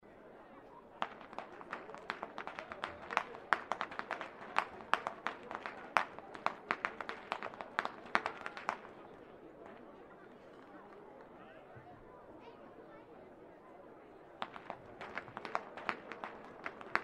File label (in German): schnalzen, jugend